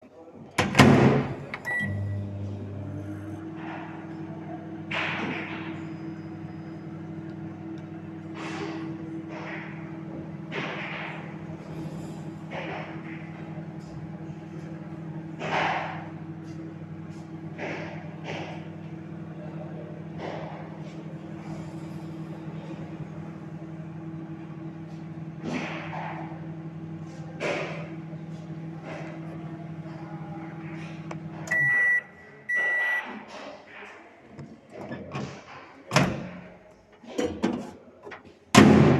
microondes

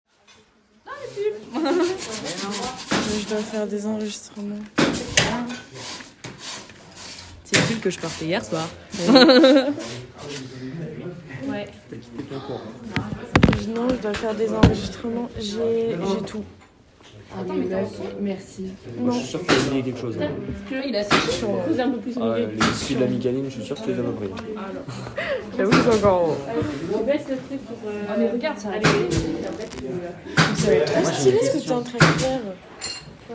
construction

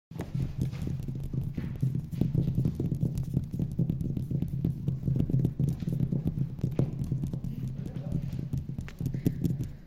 tapoti table bien

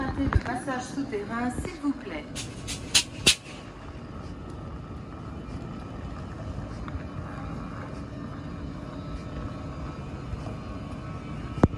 son quai garre

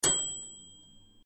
micro onde ting

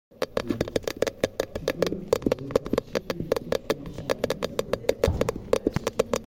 titititic

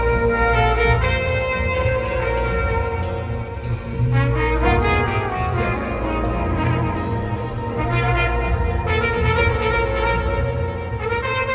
trompette

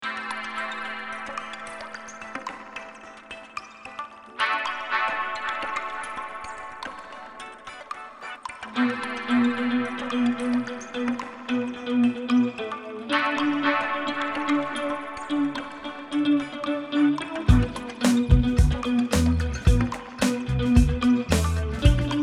disco dance; danse

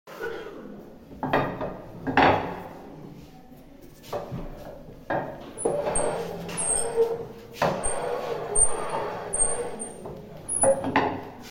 machine

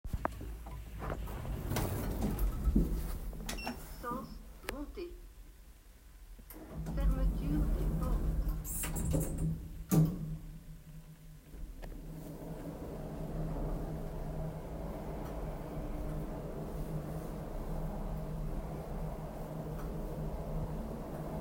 Ascenseur; deambuler

